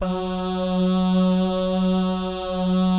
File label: BAH GUY, BAHS GUYS; Spectrasonics; Vocal Planet; Jazz FX